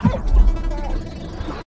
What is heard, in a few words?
punch right slow down really hard; Pitch Black - A Detective's Demise